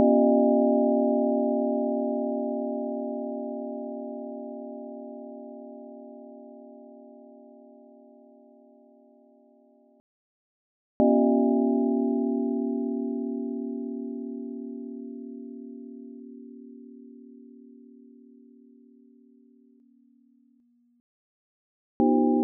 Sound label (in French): gong; Synthese additive